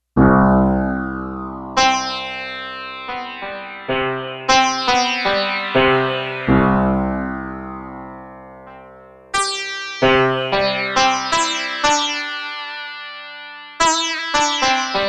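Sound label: Ethnic Sitar, synth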